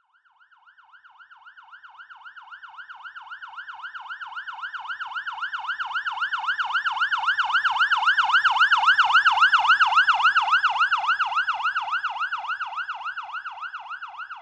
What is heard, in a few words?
Emergency Fire Truck Siren Pass By; Emergency Vehicles (Sound Effects:Vehicles)